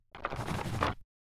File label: Scurry